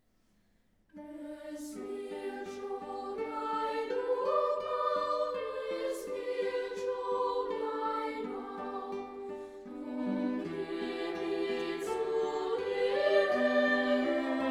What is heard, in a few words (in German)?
Es wird scho glei dumpa (Arr. for Choir & Chamber Ensemble); Sacred Choral Music